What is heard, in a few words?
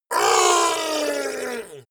fishman shout extreme pain